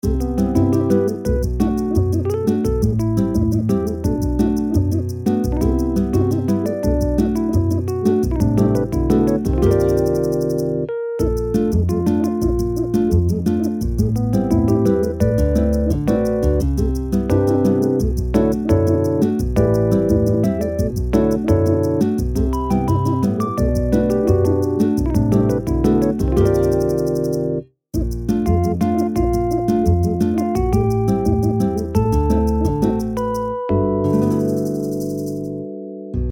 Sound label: bass bossa nova loop